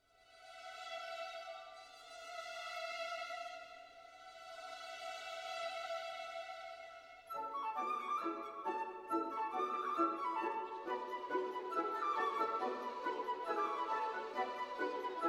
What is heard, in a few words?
Humorous Song; lyadov